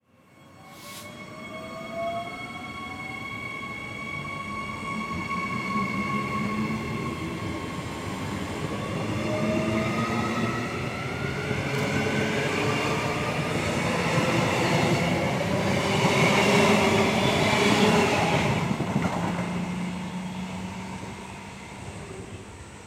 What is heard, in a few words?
Train Depart Fast